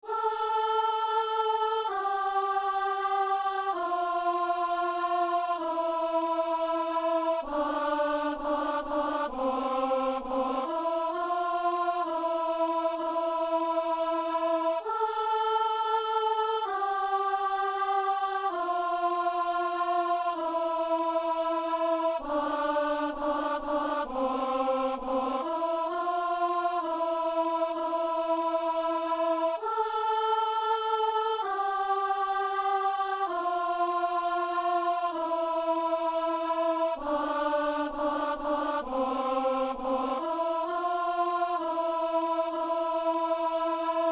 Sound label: alto; Messe de l'assemblée - Agneau de Dieu